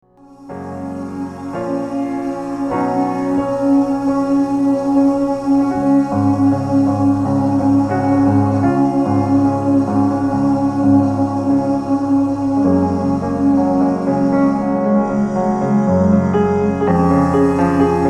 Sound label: piano and voices